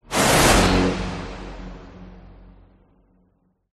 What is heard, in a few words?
whoosh; orlando lanzini